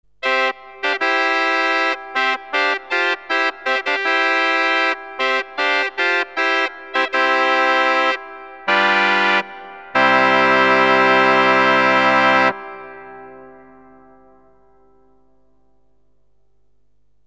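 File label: tuba mirabilis 8, organ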